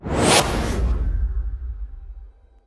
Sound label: Woosh